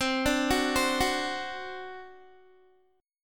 C Suspended 2nd Flat 5th C down Guitar; Csus2b5; arpeggiated

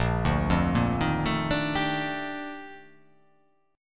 A sharp Major 13th A sharp

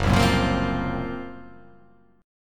F sharp Major 7th Flat 5th

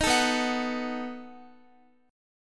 B 7th B down Guitar; strummed

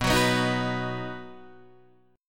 B-Major B down Guitar Half Step Down; chord shape

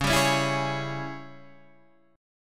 Ab Major 7th Suspended 4th Sharp 5th Db, Guitar

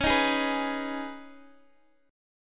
Db 7th Suspended 2nd Db, down, Guitar Open D

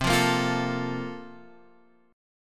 Bb-Major 7th Suspended 2nd

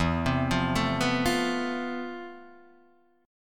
F Major Add Sharp 11th E; Fadd#11/E Chord {0 3 3 2 0 1} arpeggiated; add#11:1,3,(5),#11

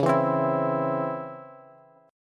C sharp Minor Major 7th C sharp Bass; C#mM7 strummed; JGuitar

